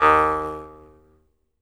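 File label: Jaw Harps, Pitched Percussion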